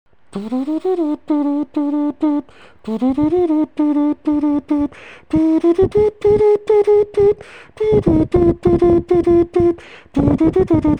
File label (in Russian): имитация духовых (возможно сакса); imitation of wind instruments *1 (possibly saxophone)